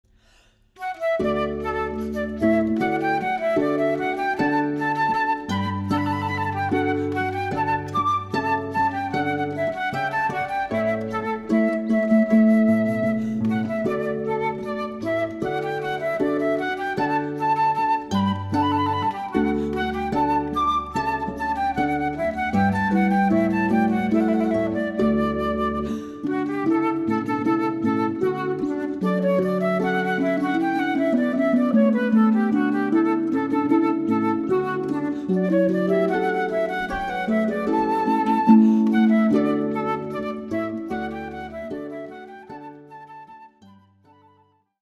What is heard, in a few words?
Rondeau Harp and Flute